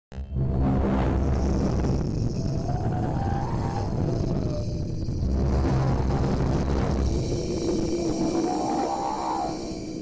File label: Sukhoi Su 75